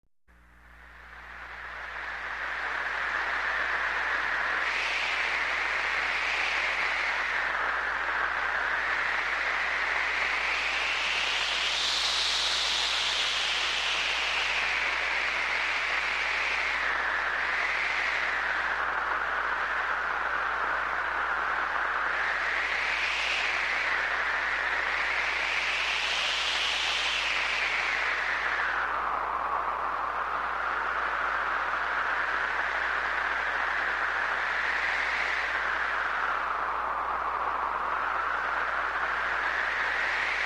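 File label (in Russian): Звук сильного ветра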